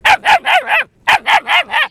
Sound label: Dog Barking